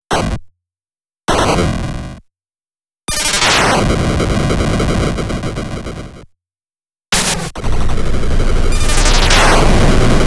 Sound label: Sound Effects; 8bit Astro Ninja Man EXA; Riki